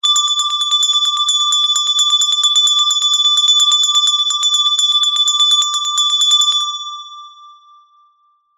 bell med small school hand ring long

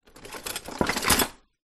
household kitchen utensil down in drawer

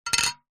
glass pane small tap on metal; foley

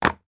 egg put in egg box

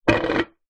container lid plastic thick set down wood

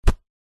clothing thick jacket punch sweetener; zapsplat

